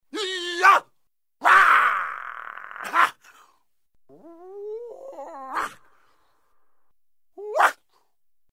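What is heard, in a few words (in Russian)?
крик кунгфуиста, звуки карате; karate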